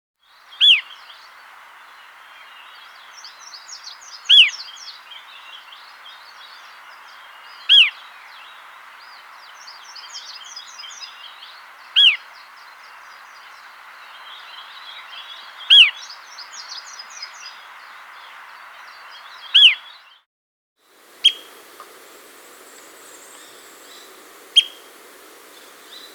Pale-Edged Flycatcher (Myiarchus Cephalotes), Andean Birds; S. America